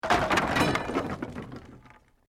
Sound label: Wood Collapse; Created dramatic effects of buildings collapsing or breaking; Materials